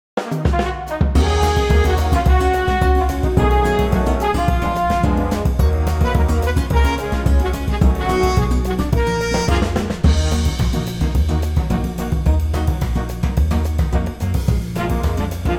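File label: big band Eb minor Eb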